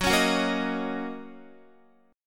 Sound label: Fsharp 6th down Guitar; F#6; strummed